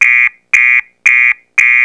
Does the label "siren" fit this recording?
Yes